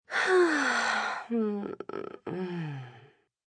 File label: Sigh Moan F, Sigh, female, moan, tired, stretch (human voice); Sighing